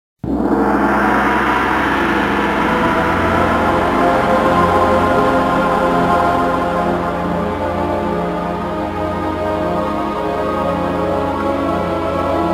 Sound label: GONG